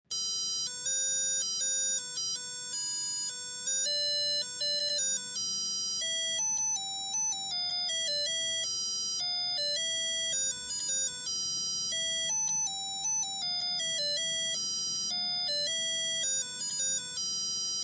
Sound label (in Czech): ukazka zvuku alarmu